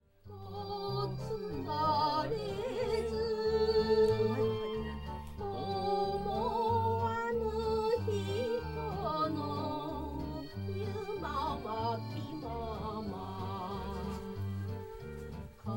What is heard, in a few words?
Karaoke